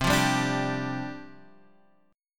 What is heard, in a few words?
C Suspended 2nd Suspended 4th; strummed; {X 3 3 0 3 3}